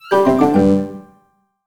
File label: Timer Runs Out; Pairs Game